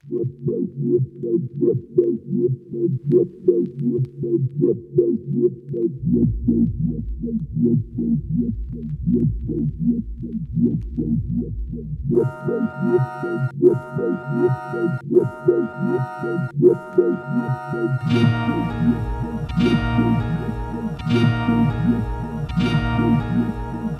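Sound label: Guitar, 80BPM, Bb, Electric, Hard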